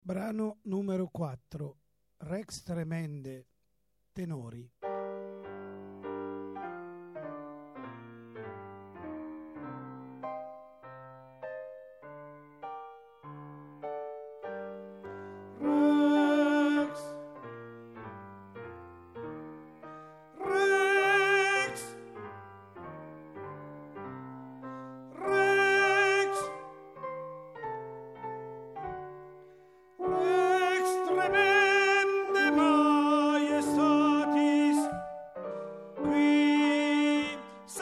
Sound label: rex tremendae ten; Requiem; Tenori